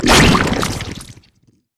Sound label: bounce splat; LoL; zac base